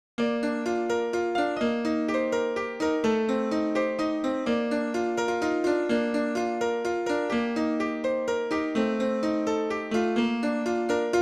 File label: Piano; SimCity 2000